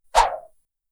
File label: swing